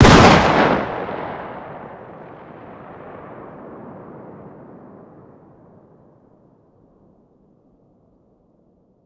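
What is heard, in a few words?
fire distant 12ga exterior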